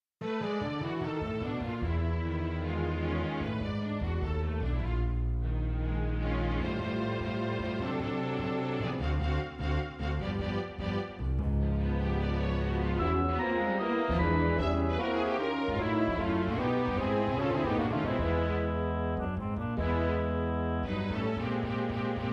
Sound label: concertino orch